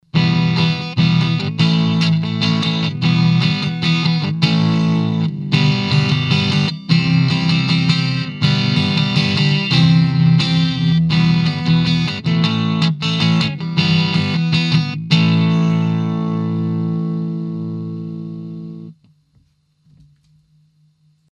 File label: Telefunken M82 E Gtr Kick off Boost on